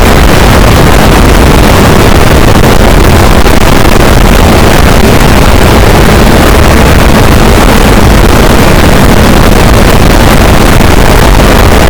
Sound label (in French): cachalot positif, boat; Physeter Macrocephalus